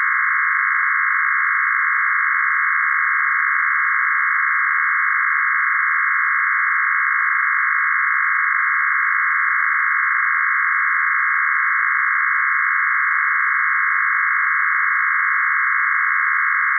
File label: freedv test